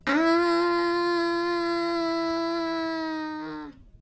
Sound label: aaaa; ld53 bagelseeker